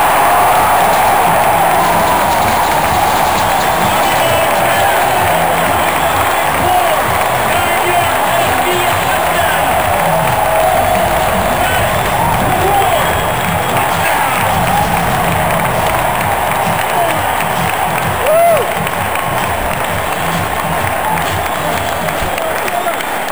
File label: touchdown; pittsburgh; football